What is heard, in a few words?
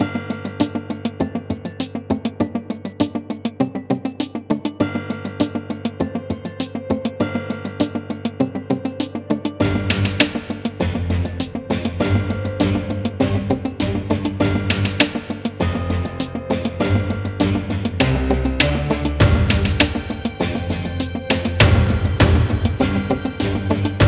Corporate Stock Drama; Dramatic corporate music that emphasizes the nervousness at the stock exchange. Also cool as a news theme.; sounds of action